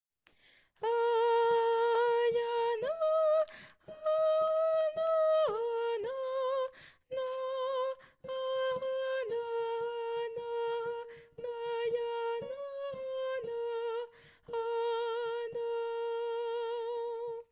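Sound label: Ténor; Mesure 43 à 58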